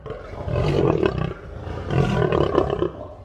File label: Lion Roar